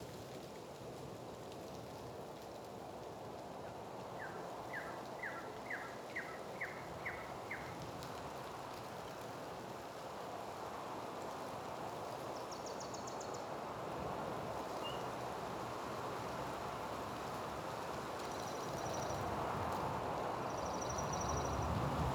ambience soft wind birds; Rust

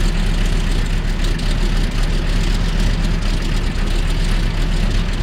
blenheim rear, engines; War Thunder

